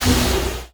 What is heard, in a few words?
space whale fire; weapons; Stellaris